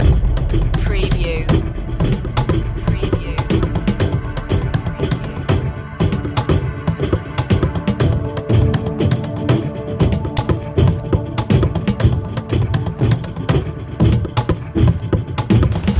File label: interference loop